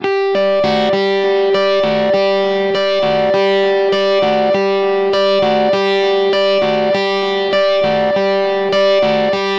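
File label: Guitar Pop G major 100bpm; Guitar and Bass; Ableton; Factory Packs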